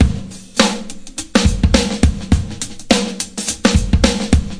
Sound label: 114 tremble big snares, drum loops and breaks